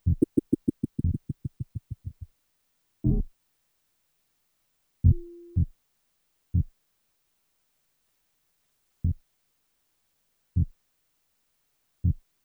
030bpm; elektron machinedrum

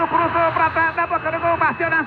Radio Sample

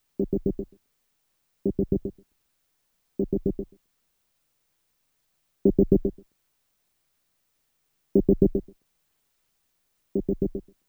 030bpm; elektron machinedrum

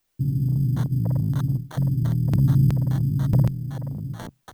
105bpm; elektron machinedrum

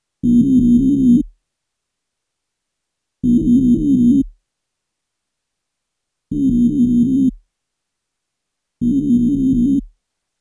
030bpm; elektron machinedrum

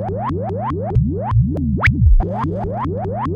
techno; techno 2000

